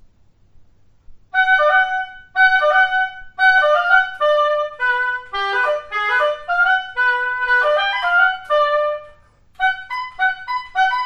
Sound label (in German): Oboe